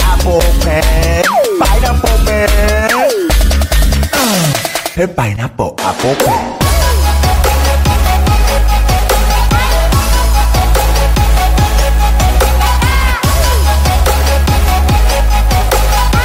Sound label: Trap Remix